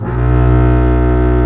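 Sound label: STRINGS, Cb Bowed f; Roland L-CD702; Cb2 f vb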